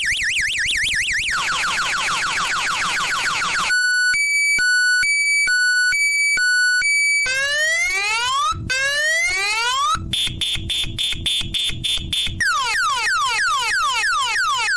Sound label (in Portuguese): alerta